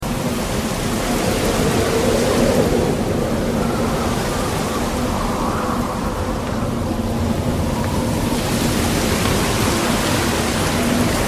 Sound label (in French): Hurlements sinistres, Vents